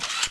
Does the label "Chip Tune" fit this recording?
No